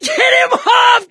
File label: scream while pounced, survivor; voice; source engine; left 4 dead 2